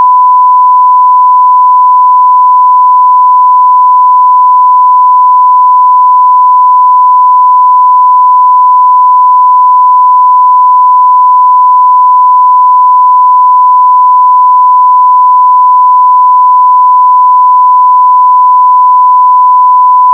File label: 1kHz sine 0dBFS